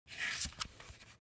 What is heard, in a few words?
foley paper magazine flip back; smartsoundfx